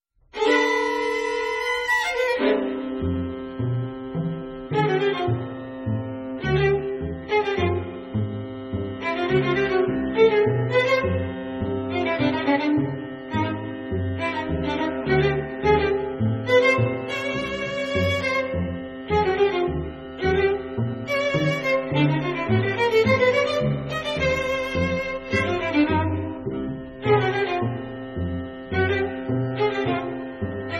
String Quartet; Krzysztof Meyer

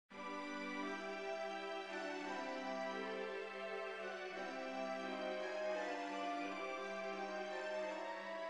Ring Leitmotiv, mid

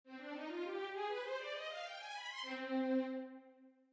tension resolve

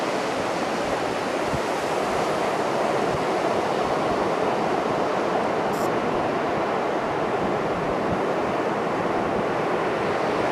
Sand Dollar digging in

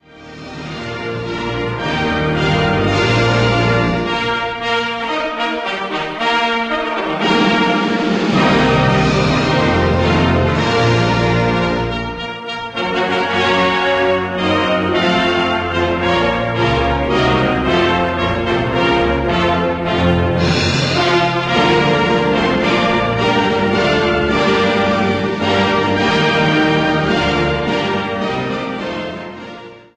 Fanfare

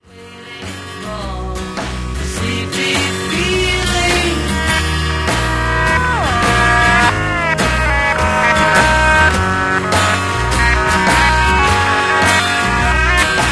The backwards guitar solo

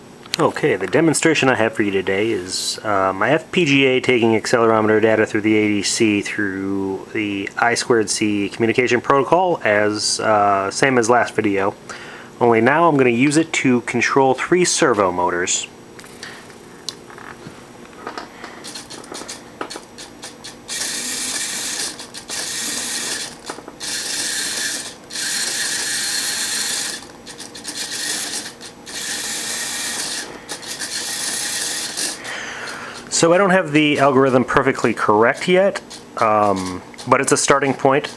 Accelerometer Controlled Servos

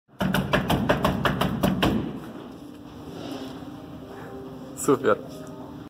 athlete beats wall; Elderly

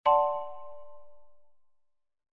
SOUND OF SURPRISE

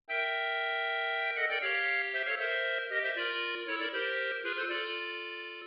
Ring Leitmotiv, mid

